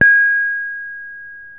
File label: reyong hi, gamelan; gravityharps